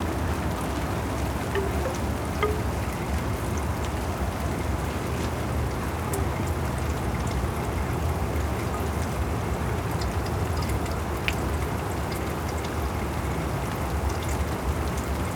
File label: Rain With Large Bamboo Wind Chime, Oregon; Omni Gardens; Field Recordings